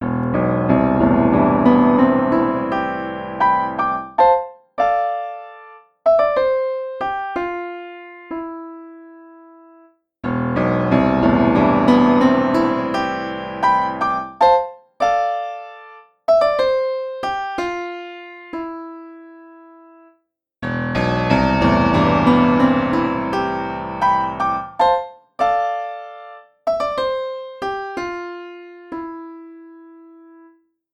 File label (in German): Piano Upright hard dann Pop; PianoV